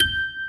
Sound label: RHODES; Pro-Rec - Synthsonic